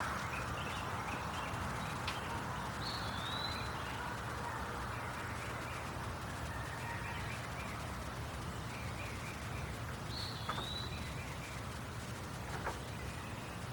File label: white tail woods, nature sounds